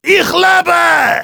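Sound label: battle cry, German; Heavy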